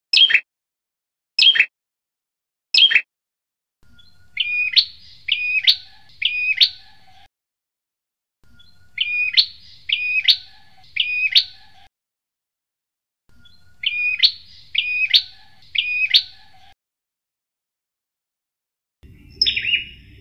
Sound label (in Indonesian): prenjak master kenari